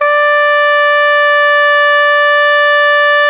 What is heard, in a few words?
SOP FF D 4, Saxophones; Greytsounds; Philip Wolfe David Crozier's Saxophones